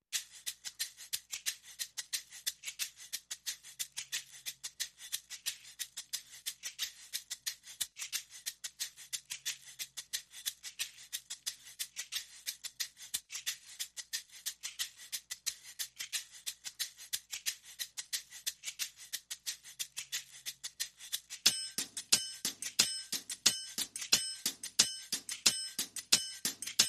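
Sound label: Percussion Only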